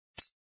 roll over

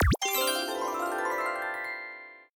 right answer effect

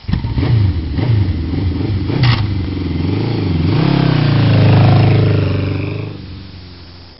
motor cycle

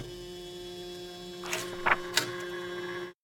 typewriter carriage stop

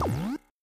wrong answer

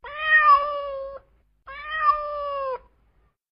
cat

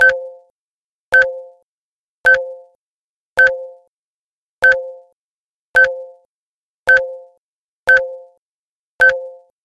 time up effect

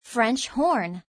french horn

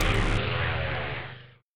wrong answer biplane slow down

